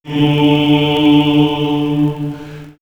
Monks Gregorian; vox mix; X-Static Goldmine

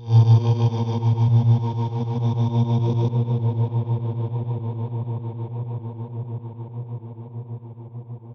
voice ambience; misc-sfx

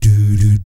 DOO DOOT, Vocal Planet Jazz

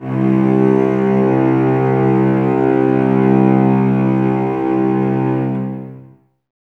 cellos C 2, String Ensembles; Miroslav Vitous; 90 s Sample CDs

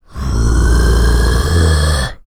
GROWL, VOCAL FX

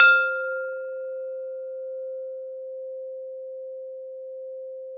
TUB C 5, tubular bell; SoundBytes - Early Patches

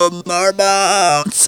OUOUOU, Funky Vocals; AKAI; USB Soundscan vol.18; TB MELODY

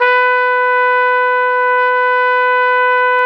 Flugelhorn, Solo Brass

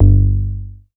G2, BASS; Q Up Arts; Dream Experience; BASS BANK 7

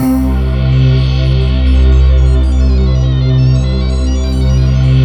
C3, SYNTH; ADVENTURE; Q Up Arts; Dream Experience

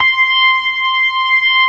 TARK, organ combos

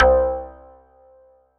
C Synth, One Shots

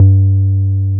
303 SUB G3P, SUB BASS

